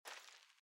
armour Light Step; out of reach treasure royale 2020 gamerip pc

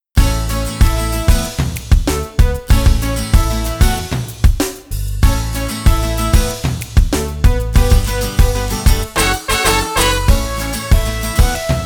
victory theme; Worms 4: Mayhem; PS2; Xbox

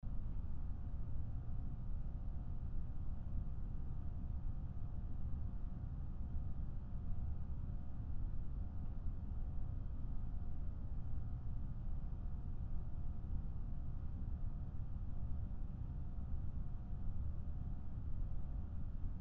House Ambience

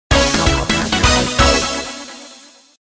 Draw Jingle Theme; deca sports 2; wii

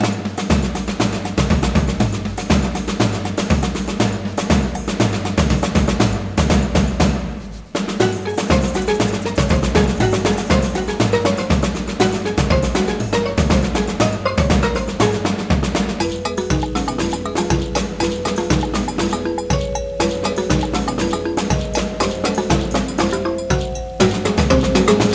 Musical Rock - Jungle; zoo tycoon 2

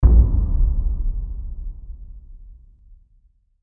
Subwoof; chivalry medieval warfare 2012 pc

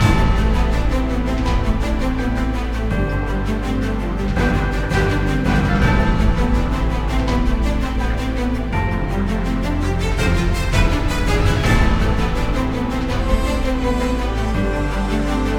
BGM Battle; aster tatariqus android ios mobile gamerip 2023; BGM Battle General3 Playerturn

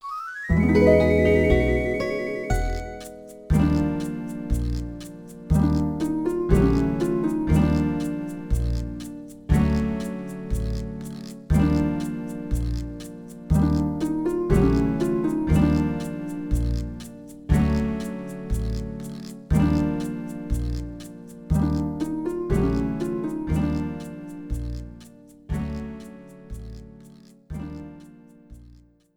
Success; Dingle Dangle ~ Block Star

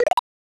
Menu Sound Effect; Konae-chan no Dokidoki Pengin Kazoku; SNES

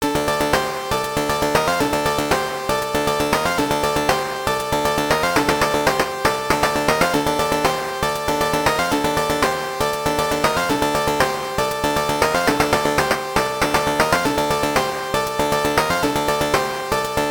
LAST BOSS; dragon egg; PC Engine

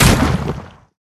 Bertha Impact; Level16

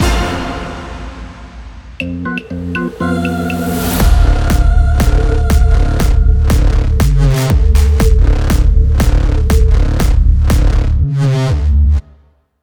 Fail; doughlings arcade macos ps4 switch windows xbox one gamerip 2018

